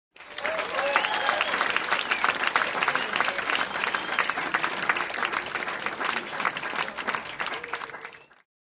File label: TV Show Applause